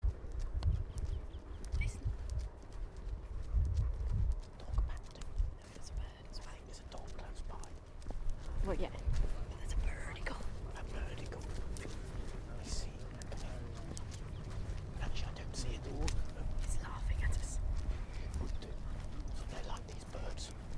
planes and laughing birds, probably green woodpeckers; at kew gardens; guidedogvance